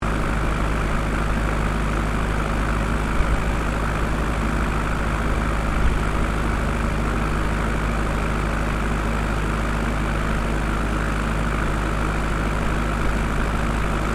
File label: GENERATOR KUBOTA GV1120 OPERATING EXHAUST LOOP STEREO, Industrial generator